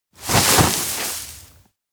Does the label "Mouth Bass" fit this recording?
No